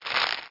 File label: swing; Spider-Man Print Kit